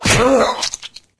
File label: wolf; cstrike